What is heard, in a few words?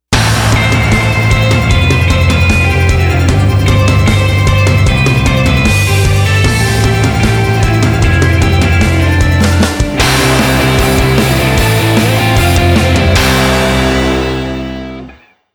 INDIE ROCK